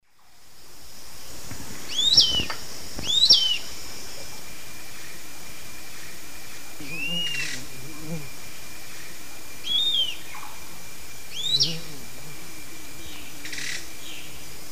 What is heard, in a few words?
Tolmomyias assimilis, song; S. America; Eastern Lowlands